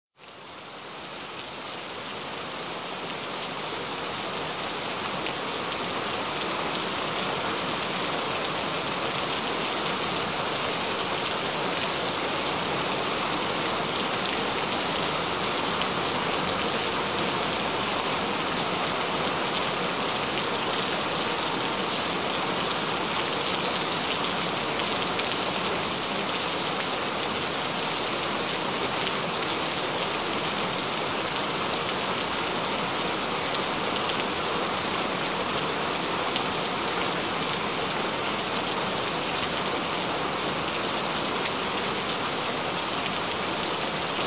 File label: Gentle Rain Sounds